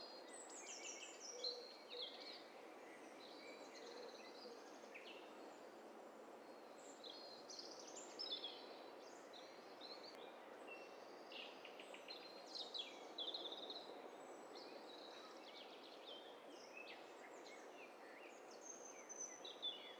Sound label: STEREO BIRD COCKTAIL PARTY